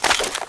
clip out, weapons; cstrike; M4A1 Carbine

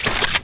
pickup; cstrike; codmw2

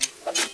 claymore; cstrike; codmw2

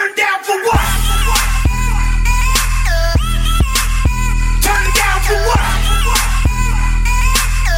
win sound; pubg

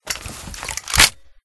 rpg reload; cstrike; pubg

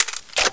clip in; cstrike; M4A1 Carbine

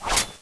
knife hit wall; cstrike; codmw2

